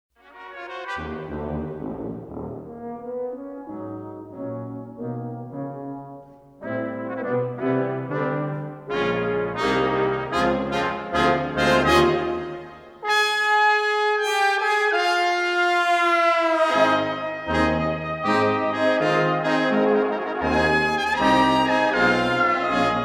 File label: Principal Brass